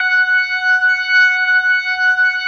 F#5S, B3, Vintage Keyboards

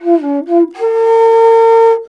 INDIA BASS, IND BASS

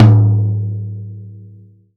tom, velocity tom; VELOCITY TOM 2

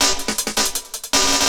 LOOP, 160 BPM, Drum & Bass; Ueberschall; Drum & Bass Resonance

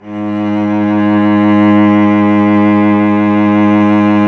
STR VC. G#, SOLO SECTION; Roland - LA Composer vol. 1

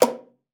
KLPA B3, ALTOSAX; Xsample VOL-2

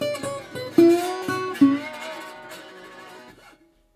120, Blues Guitar; ILIO - Fretworks

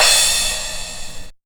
Crashes, Drum Hits; Crusty Zidija; Nu Dance Electro

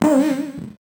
VocoBit Noise, 8-bit; musicradar; 8-bit bonanza

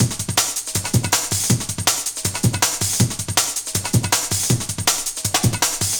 beat, drum & bass beats & breaks; Ebony; Sonic Foundry (Sony Creative Software); Fluid Dynamics Drum & Bass

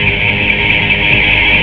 FX GTR, GUITARS KIT; Big Beat Samples & More; AKAI

